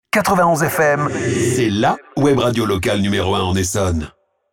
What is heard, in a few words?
Drop Jingle